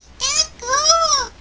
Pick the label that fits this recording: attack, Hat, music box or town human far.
attack